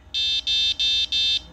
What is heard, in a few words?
Module Configuration Bad - Four single-tone beeps; Node + Module Sounds